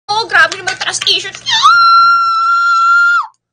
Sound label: nyooooooooooooooooooo